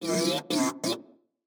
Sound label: construction robot; factorio 2.0.55